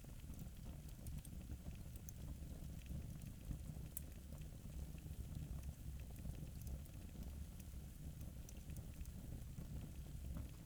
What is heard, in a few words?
flames